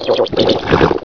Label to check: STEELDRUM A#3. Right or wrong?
Wrong